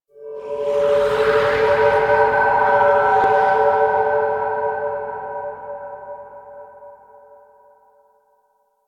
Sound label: Moon Nursery Reveal Sting; Warframe; Ambience; Orokin Moon